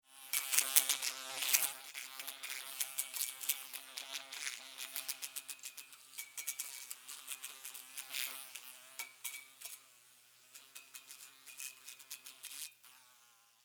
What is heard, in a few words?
Fly, Light, Bulb, Buzz, Around, Wings, Insect, Bug; Flies; Sound Effects:Animals:Insects; Blastwave